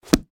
Cuaderno cerrar

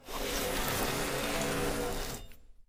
DESLIZAR CUADRO PARED

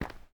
Paso zapatill cemento

